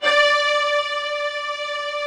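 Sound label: string, orchestral strings; Greytsounds VOL-1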